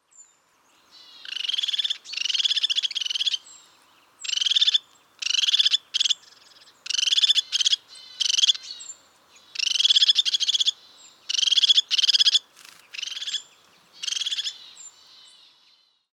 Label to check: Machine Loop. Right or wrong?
Wrong